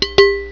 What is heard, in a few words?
trash